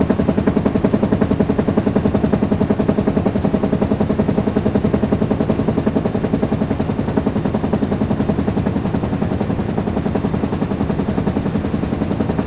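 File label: helicopter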